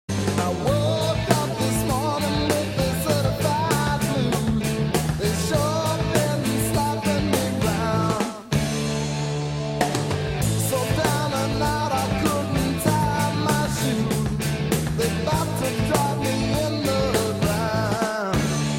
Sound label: Certifed Blues